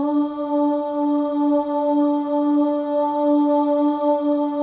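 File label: OH, female OHS, Voices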